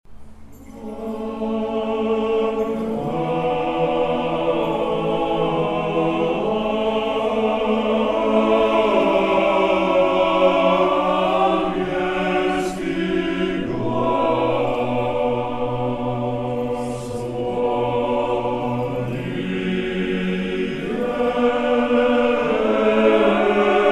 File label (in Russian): Hor Arhangel'skiy glas; Moskovskogo Sretenskogo monastyrya